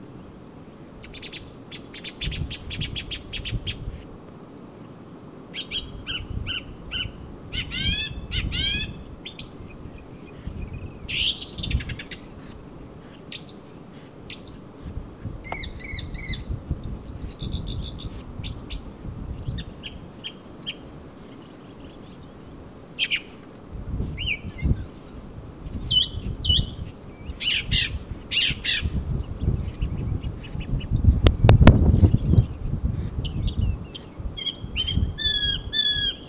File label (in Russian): pevchiy drozd turdus philomelos; nochnyh ptits